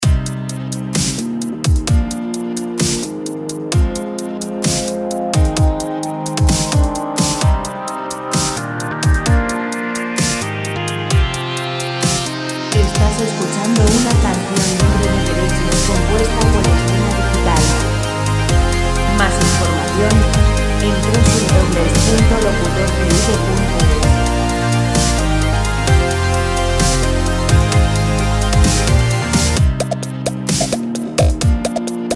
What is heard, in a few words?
piano relax; background free music; documentales; Business voicemail